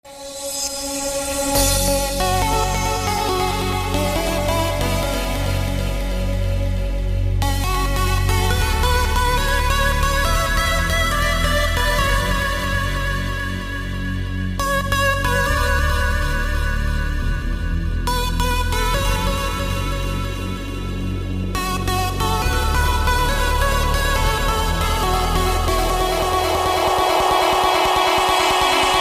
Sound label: trance ringtone